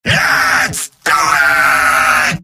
battlecry; demoman; video games; source engine; tf; mvm

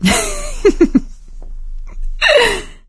laughter, survivor, voice; source engine; left 4 dead 2; producer

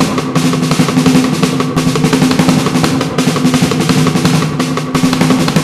snare horde; Left 4 Dead 2; Source Engine; zombat

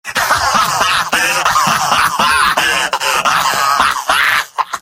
scout laugh long; tf; mvm

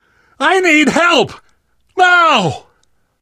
dying, survivor voice; source engine; left 4 dead 2; namvet

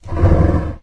dangerous creatures default sound; windows 9x; sound schemes

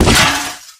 robo impact soft; source engine; tf; mvm; physics; video games

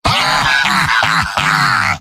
laugh happy; heavy; video games; source engine; tf; mvm

